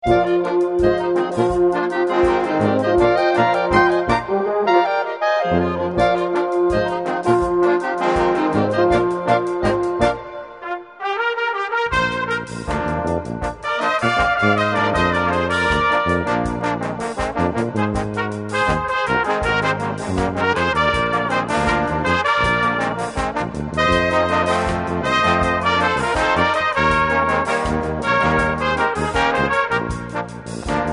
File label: Funk